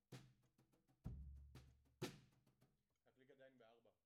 Floor, 96bpm

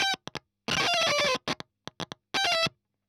EL Fuzz End

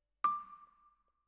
Vibra Close

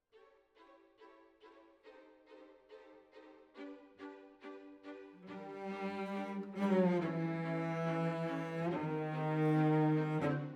Cellos

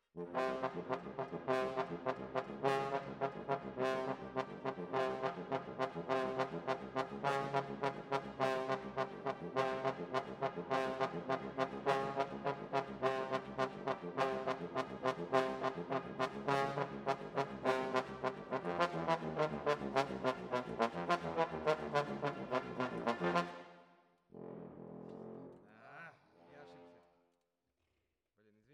Trombones, jazz for piano

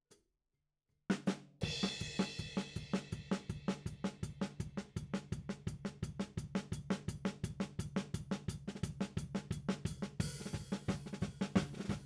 Tom

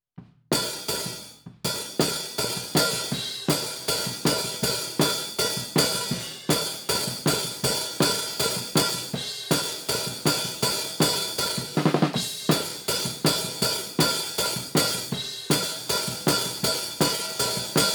HiHat, 160 BPM

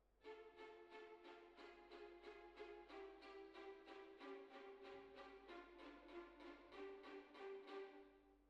Hall, Jazz for piano

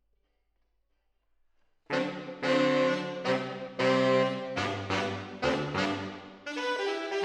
MKH AB, piano

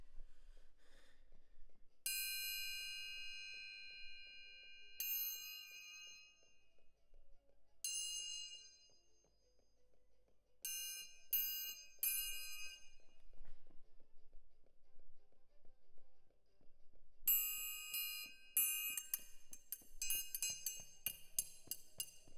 Perc Close

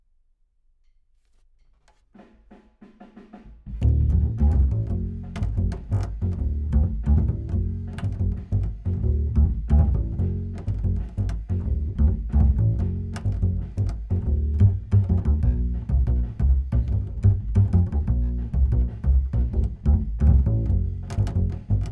Bass

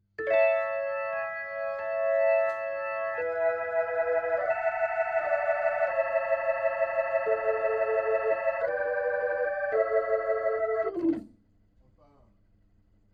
Hammond Leslie

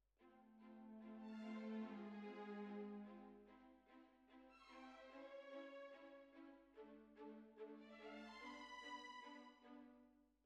Bassoon, Jazz for piano

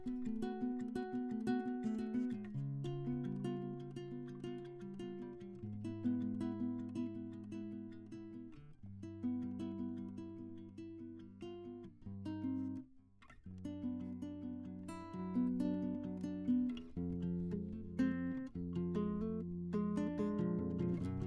Classic Guitar

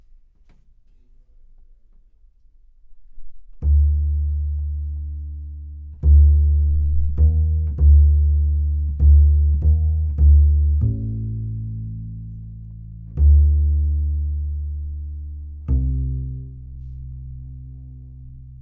C Bass Bot

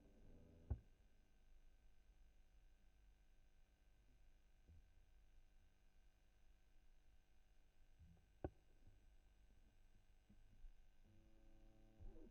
GTR

